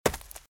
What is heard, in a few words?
Footsteps - Leaves - Run - Left; RadwinIsland